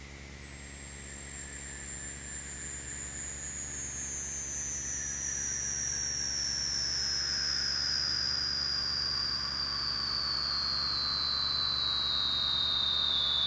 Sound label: ufo, space; WAV Sound Effects